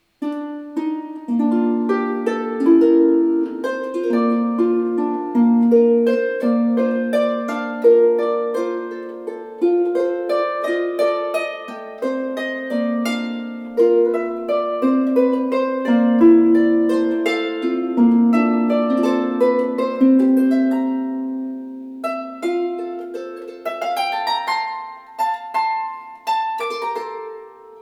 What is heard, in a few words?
harp; チャイコフスキーの弦楽セレナーデ; ２楽章（ワルツ）; 小型ハープ用から